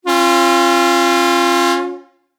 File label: Ambulance Horn, Emergency, Medical, Ambulance, Horn, Honk; Hospitals